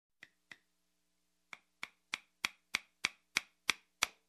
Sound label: Tack hammering a piece of wood